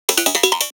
glitch bass fill 74bpm

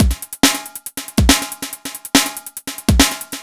Synth Loop BPM140

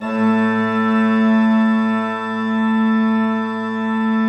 PLENO A2, Cathedral Organ; ILIO; Propeller Islands

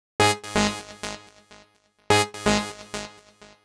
Synth, 126bpm, Nu Dance Electro; Zero-G; Soundclash; Combi Loops; Dubnotronic

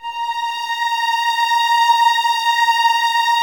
A#5, 11 Violins, Soft; Miroslav Vitous

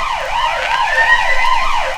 alarm, FX & ATMO, ALARMS & ALERTS; Techno Trance Essentials

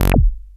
SHORT ACID, BASSES; Masterbits - Vintage Voltage; VV BASSES

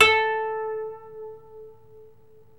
KOTO MILD

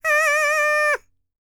PERSIAN, World Voices; ILIO; Vocal Planet VOL-5

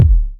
909 KICK; AKAI S-Series CD-ROM Sound Library VOL-4

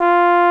TBONE F4, TROMBONES; Greytsounds VOL-1

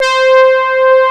MOOG LD; MINIMOOG; Greytsounds VOL-1

